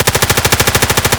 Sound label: m4 fire distant loop; Escape from Tarkov